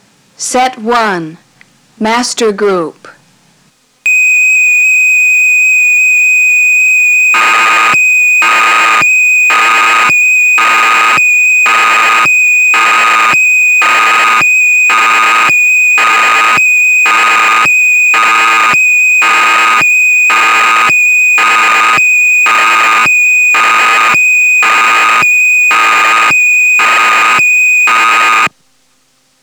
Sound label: Master Group, dx9